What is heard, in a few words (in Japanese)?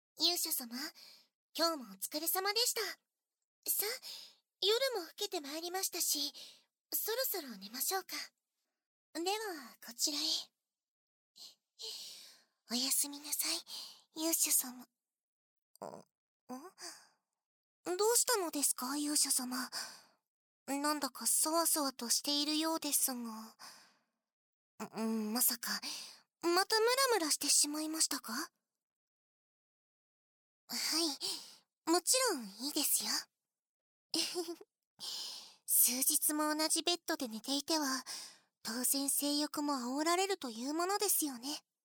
耳舐めと囁き